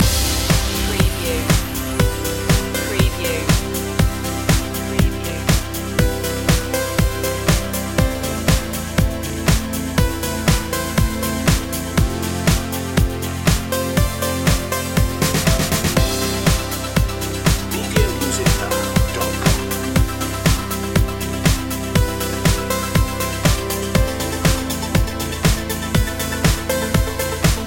gliding waves loop

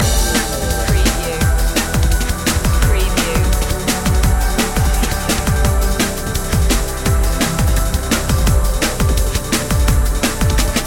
living dnb loop

